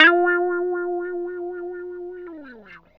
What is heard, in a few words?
guitar, C guitar